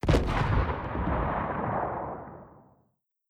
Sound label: Rocket Launcher Far Impact; caverners game design